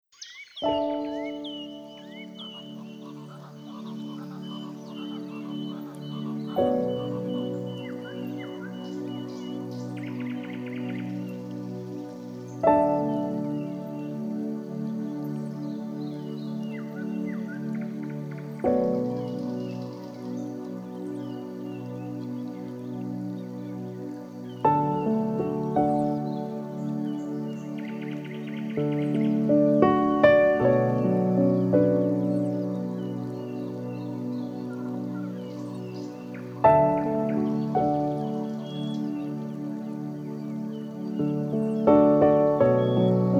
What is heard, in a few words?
Relaxing Music with Nature Ambiance